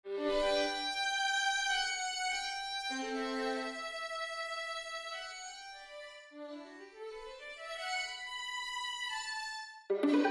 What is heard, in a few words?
violin; sibelius sounds